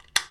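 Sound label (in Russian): кнопка клик двойной спокойный далекий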